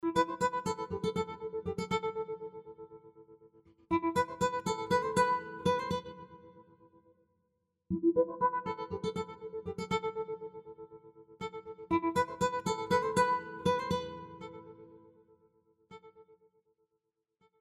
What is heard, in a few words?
Scoring Acoustic Guitars Melodic Pulses And Phrases FX